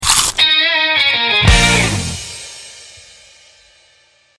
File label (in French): identite sonore logo; Thaas Chips